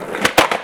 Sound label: Roll jump